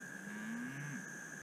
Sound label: Cow Moo